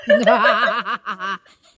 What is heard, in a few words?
laugh